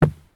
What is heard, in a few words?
sprint wood; Escape from Tarkov